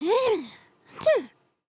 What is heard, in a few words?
sleep twitch